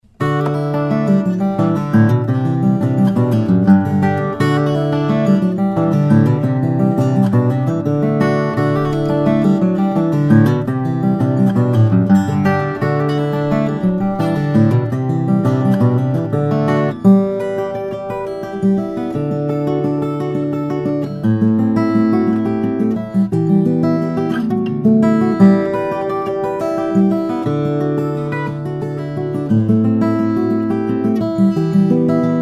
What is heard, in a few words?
taylor ps12c presentation, steel string guitars flattop; grand concert